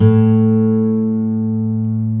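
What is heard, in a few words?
GUI Ac. A2, acoustic guitar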